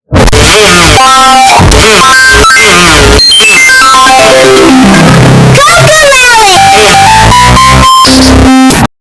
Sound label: intro earrape; Cocomelon